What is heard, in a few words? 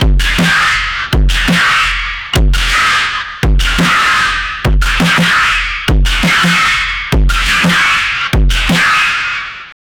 vhs post apo rave distort